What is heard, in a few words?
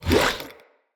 Attack Short, Vocals; Enemy; Characters; Corrupted Sproutfolk